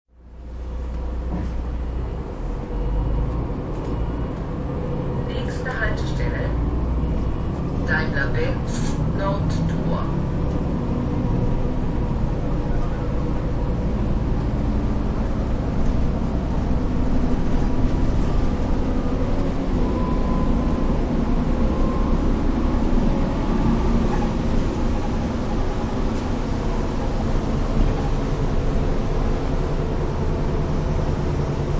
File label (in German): Busfahrt Linie